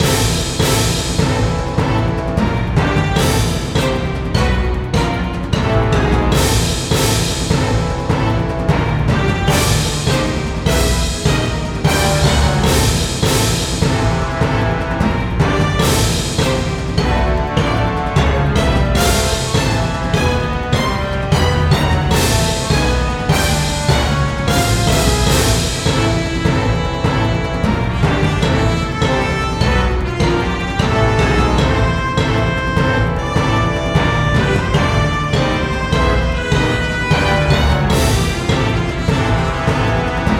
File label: BGM; Heroland